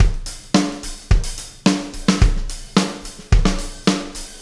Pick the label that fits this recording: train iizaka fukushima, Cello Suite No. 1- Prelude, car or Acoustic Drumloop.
Acoustic Drumloop